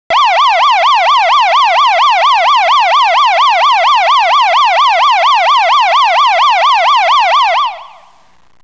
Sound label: siren yelp; Federal Signal